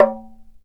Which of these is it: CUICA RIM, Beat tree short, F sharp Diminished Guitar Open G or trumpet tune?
CUICA RIM